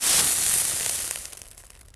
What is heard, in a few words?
flameout; player